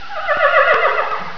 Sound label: horse